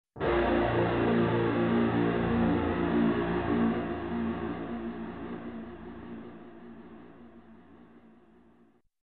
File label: Muffled 80 s chorus electric guitar stinger